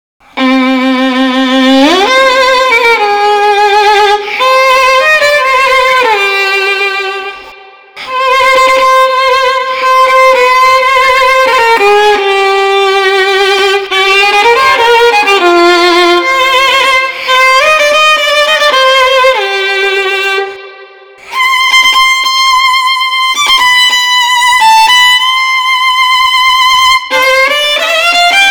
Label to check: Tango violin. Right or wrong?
Right